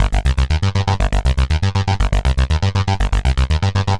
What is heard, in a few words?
Arpeggio Bass, Prototechno; Sonic Foundry (Sony Creative Software); Basses